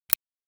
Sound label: Assault rifle fire selector; gfxsounds